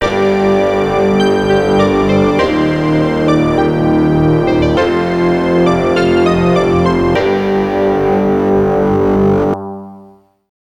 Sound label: GAME OVER